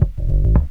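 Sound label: bass riff; INSTR LOP; E-Lab; X Static Goldmine 2; akai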